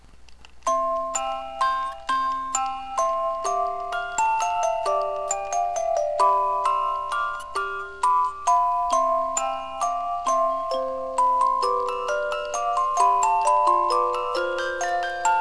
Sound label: Waltz